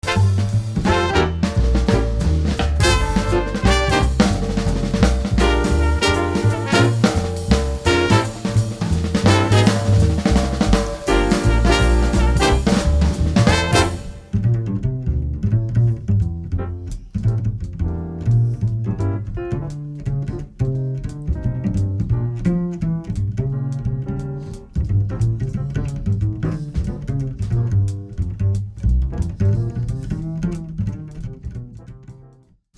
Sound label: Blues Bass